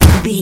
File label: punch heavy huge distorted